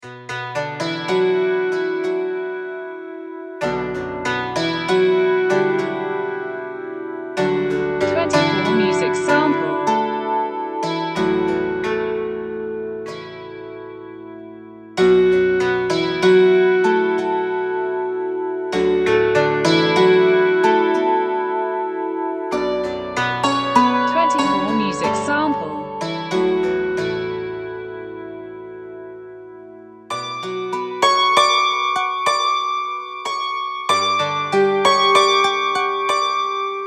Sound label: dulcimer sample